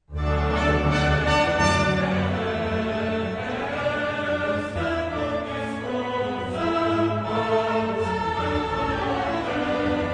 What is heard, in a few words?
Cantata, chorus; Bach; BWV80; Ein feste Burg ist unser Gott